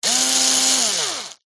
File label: industrial electric cordless screw driver or drill very short burst; zapsplat